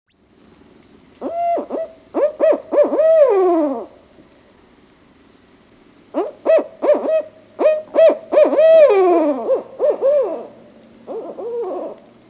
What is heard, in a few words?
Typical Barred Owl Calls